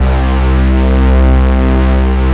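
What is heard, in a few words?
PWM brass C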